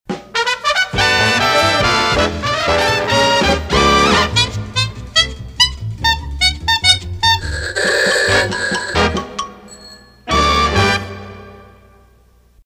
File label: Antique Horns; PAMS; KISN